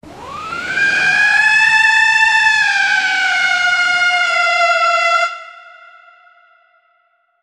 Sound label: fanfare short